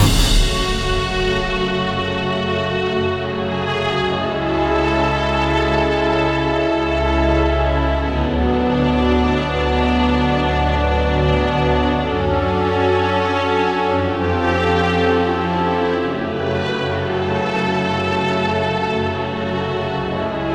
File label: BGM Boss Fight Loop